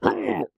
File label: Hurt; Amanita